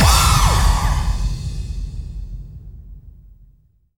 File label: BD-FX, Dance Mega Drums; Best Service